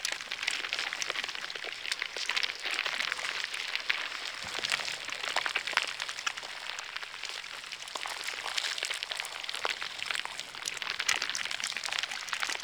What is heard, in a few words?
Memory Room Infestation Loop; Warframe; Sacrifice